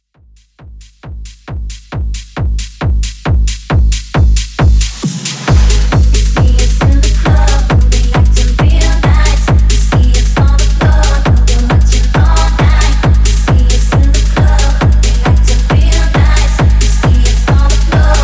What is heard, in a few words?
Rock Da House 135 Bpm